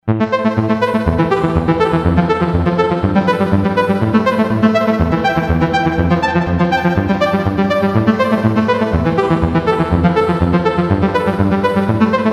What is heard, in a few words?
korg m1r synth; synth: syntbassy; cpu NEC-V50 (part of 70216 family) manages 16 voices polyphony